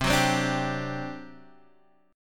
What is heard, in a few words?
G Major 7th Suspended 4th, C